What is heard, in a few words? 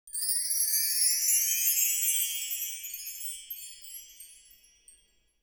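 wind chime up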